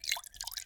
Water, Flow, Glass Bottle Pouring Into Bamboo Cup, Slow, Long, Gentle, Splashy